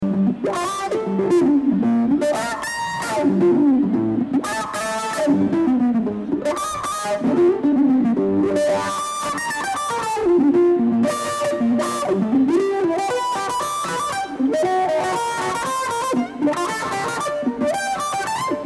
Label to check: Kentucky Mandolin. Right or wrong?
Right